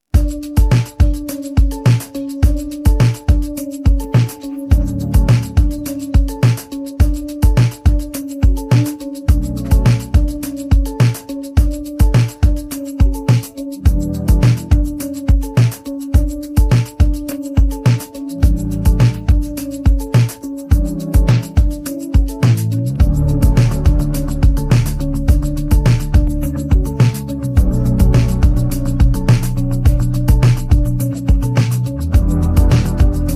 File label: no vocals